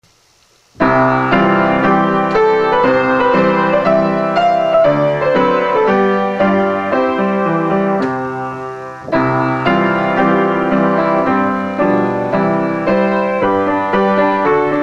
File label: koka piano; yuda-e; school song